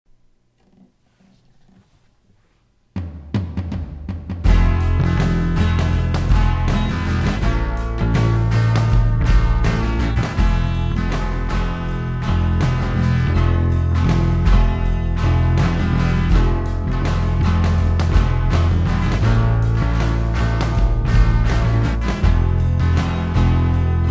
blues

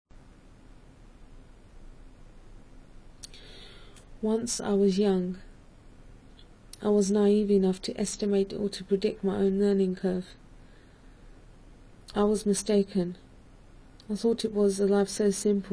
harp

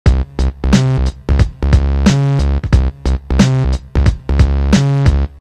half step vamp bass

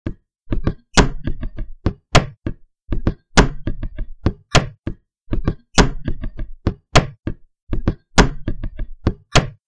desk beat noiseless

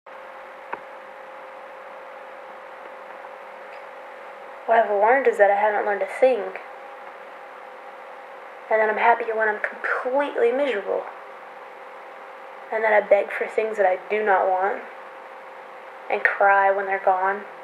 choir

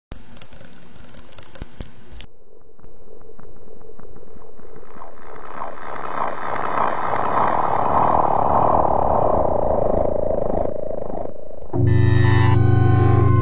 Instrumental

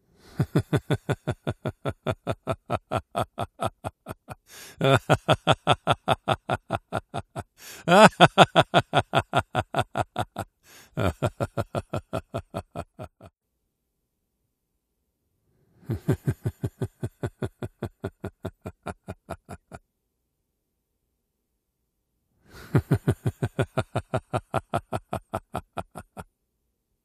laughs

